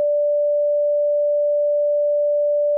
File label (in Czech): sinus 585; KMITÁNÍ A VLNĚNÍ; STRUNA; virtins